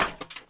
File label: Glass Step, Footsteps; urbanchaos